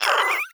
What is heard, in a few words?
vox hungry end, creatures, snark